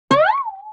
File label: Guitar Plink Slide; Vintage Cartoon; Musical Elements